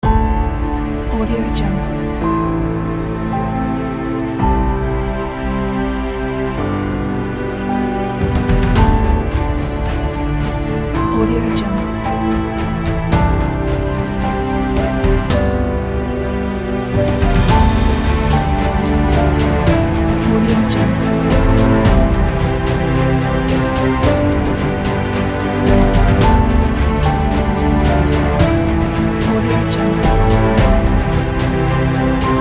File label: adventure cinematic